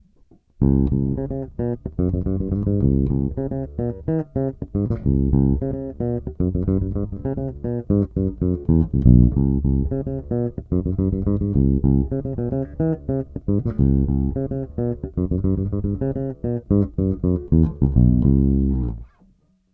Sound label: Bridge Funk